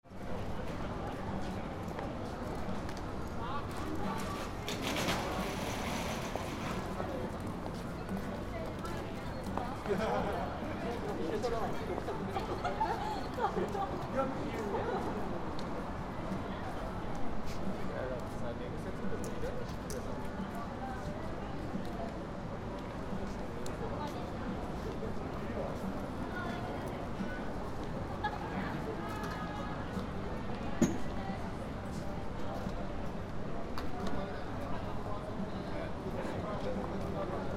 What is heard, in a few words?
ekimae street